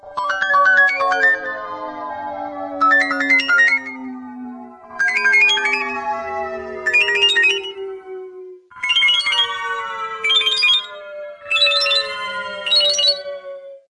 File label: Ringtone; Samsung Galaxy F22